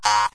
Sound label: musica exclamation; windows 9x; sound schemes